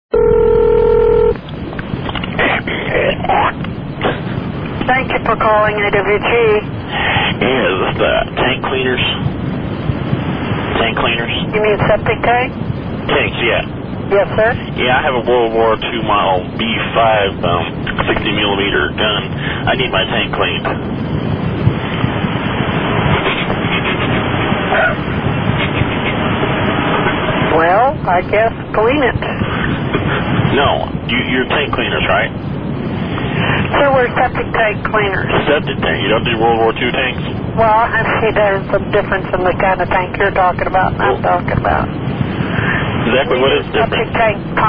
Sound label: tank cleaning; plamedia2008